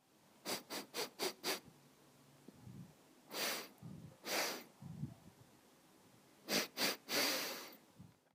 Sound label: Sniffing